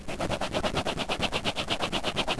SCRIBBLE

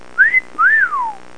whistle; BIRDY V3.01 Train Bird to Talk

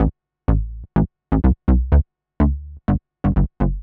SNTH BASS HOUSE 125 A; eJay

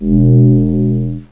Teleport; mordor

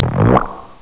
PHART, humanos

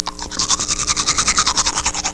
brushing teeth, cartoon sfx

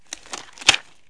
Surgical Glove Off; KABOOM! - Wild and Wacky Sound Software; City Life; Business

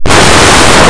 cabinet; Blue Heat

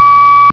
ans beep

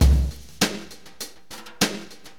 Acid Jazz Funk, Drums; LiveAct Pool 1

